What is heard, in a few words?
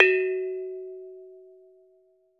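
METALOPHONE, Pitched Percussion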